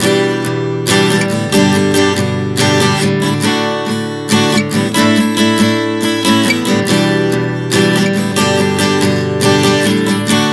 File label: Progression 070 Em7-G-D-A, Acoustic Guitar, Chord Progressions 070 bpm; Sonic Foundry; Songwriter Acoustic Guitar Companion